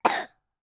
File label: human 3 year old boy cough